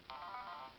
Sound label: motorola razr ringtones chime slow